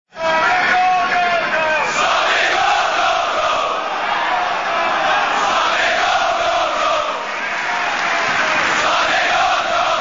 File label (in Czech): gol gol gol; Slavie